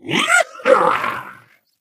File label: ballbot jump spin